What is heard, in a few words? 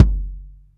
BD, Bassdrums, Roland TD-20